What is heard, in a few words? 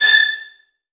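VN FX A4 left; ILIO; Kirk Hunter Virtuoso Strings VOL-1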